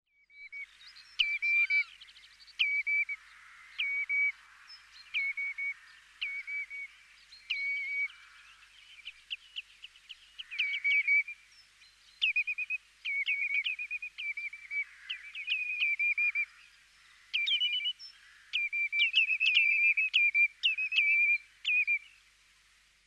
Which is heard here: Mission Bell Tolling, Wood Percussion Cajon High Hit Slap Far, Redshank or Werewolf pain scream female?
Redshank